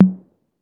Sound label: TOM 808; Roland LA; DRM Toms